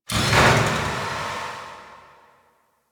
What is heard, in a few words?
Corner Hall Rand Metal; Warframe; Ambience